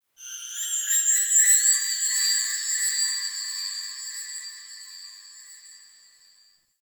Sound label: High Texture, Ambience; Warframe; The New War; Zariman